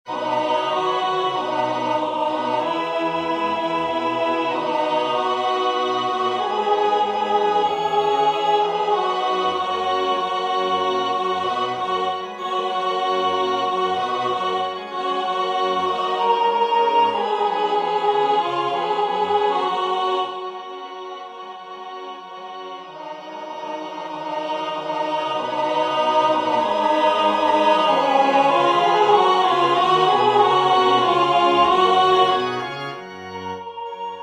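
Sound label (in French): alto, Musique sacrée; Exultate Deo; Haydn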